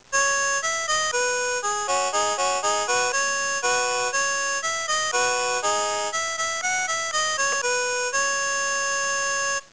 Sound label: harmonica